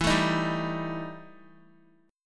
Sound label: E Minor Major 7th Double Flat 5th, Guitar; 4F,4A,5C,5G,5C,6E; strummed; x,7,9,8,7,x; JGuitar